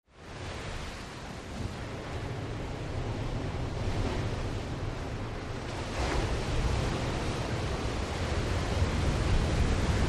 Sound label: Waves Medium Crashin